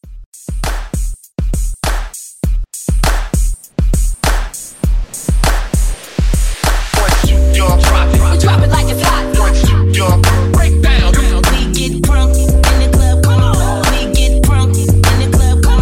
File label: Hype Re Drum